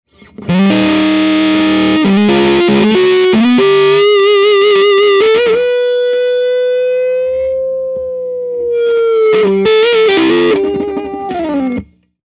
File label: fuz feedback loose